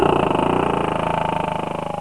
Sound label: Roar; Action Dome